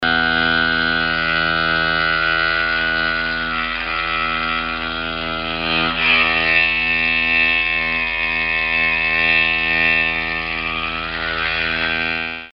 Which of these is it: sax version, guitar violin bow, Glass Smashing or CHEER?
guitar violin bow